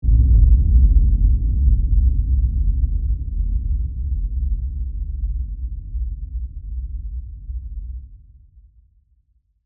Horror Hit LFE, Horror, Hit, Lfe, Rumble, Accent, Transition; Production Element, Imaging Element; Scary Tone; BlastWave